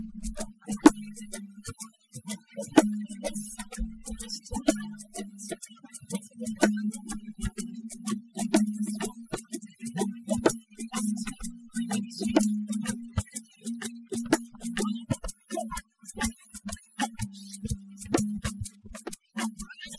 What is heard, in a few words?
percussive